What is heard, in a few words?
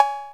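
COWBELL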